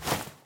sprint rustle

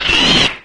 pain; doom3; archvile

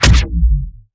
rift explosion

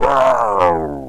pain, zombies

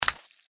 mantis foot; npc

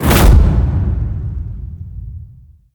whoosh

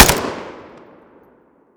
shoot unsil, scar; cw20 extras

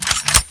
clip in; tfa cso; magnum lancer

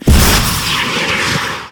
take off; snds jack gmod

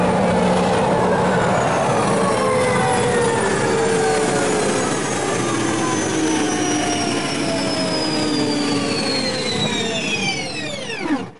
gen stop

